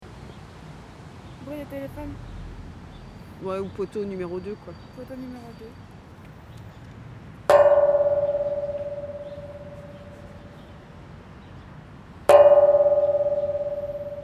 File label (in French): poto metal